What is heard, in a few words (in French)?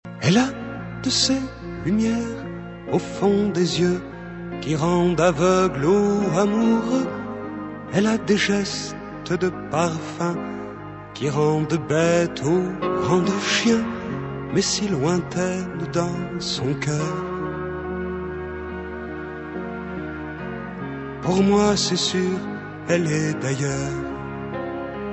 Spirale